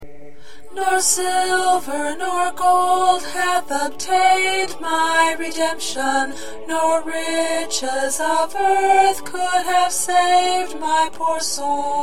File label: Alto, choir parts